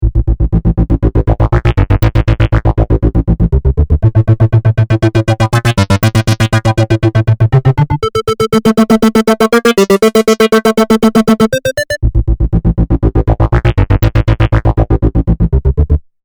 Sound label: synth